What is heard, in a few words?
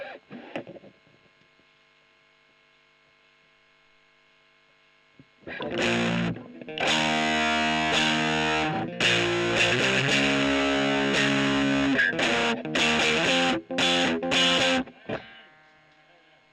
E.GTR Gefell